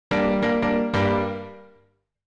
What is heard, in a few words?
PIANO 96 G; old school